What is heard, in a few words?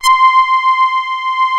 SAW LEAD; PPG; analog; USB Soundscan vol.49; Analog Fantasies; AKAI